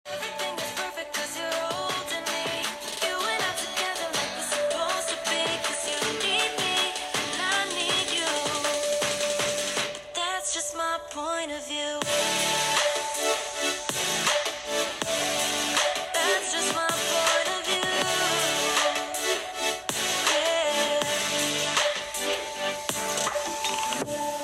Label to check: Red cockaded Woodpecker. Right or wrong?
Wrong